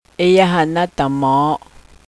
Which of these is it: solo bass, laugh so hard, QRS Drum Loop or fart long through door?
laugh so hard